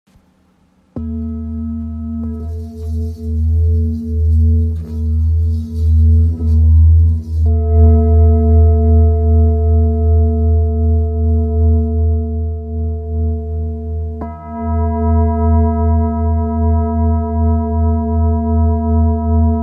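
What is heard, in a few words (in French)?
Bol therapeute la diese, bol chantant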